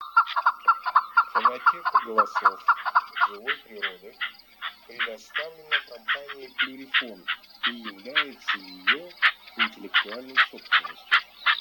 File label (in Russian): Кеклик Chukar Pernice